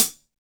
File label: CHH, Hi Hats